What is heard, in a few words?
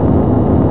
RUMBLE